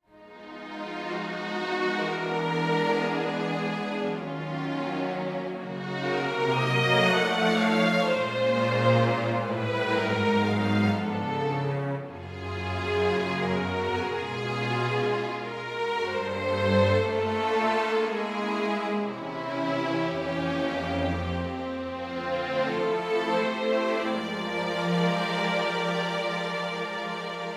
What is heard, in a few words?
strings phrase; Hollywood Strings Silver